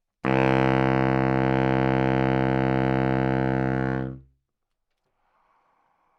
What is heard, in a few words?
Sax Baritone C3